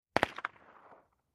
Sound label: MP5 9 mm Machine Gun Burst From Distant Point of View, X4